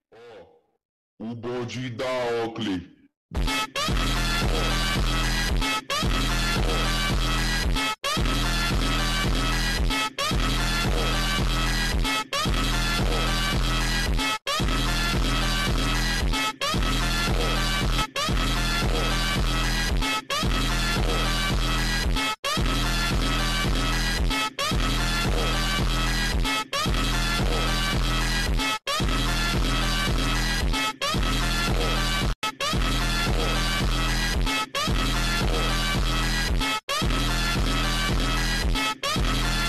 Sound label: slowed reverb